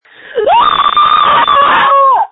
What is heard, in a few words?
screams